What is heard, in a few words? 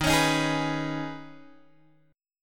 D sharp 7th Suspended 4th Sharp 5th, Guitar, Open G